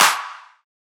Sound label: Riddim Clap; One-Shots; Ghosthack; Riddim Essentials Volume 2